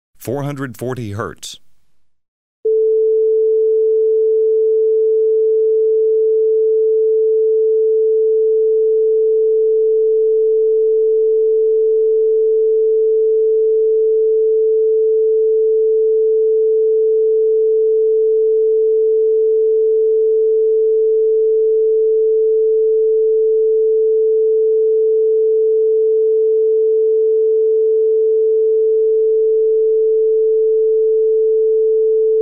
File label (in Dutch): Een sinus van 440 Hz, -10dB